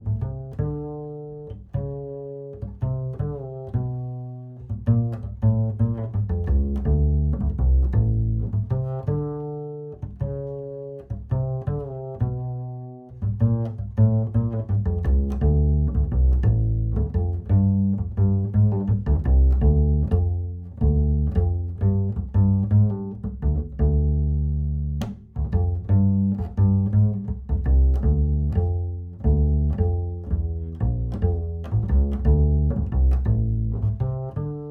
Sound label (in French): contrebasse